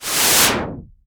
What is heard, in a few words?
IMP WHOOSH